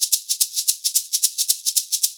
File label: 110 SHAKERS, Percussion Loops; USB Soundscan vol.36; AKAI